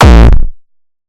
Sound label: Wreckage Thunder kick Short